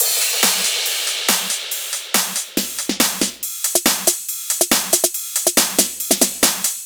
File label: drum Loop 140BPM SN Fills; Multi Loops